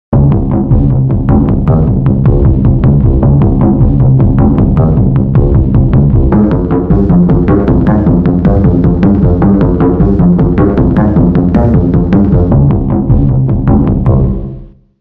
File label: Bond bass